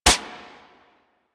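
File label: submachine gun Shot; Warframe; Tenno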